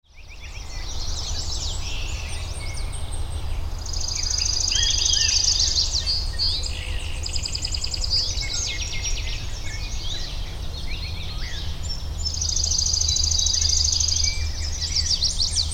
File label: Olive-sided Flycatcher; Finzel MD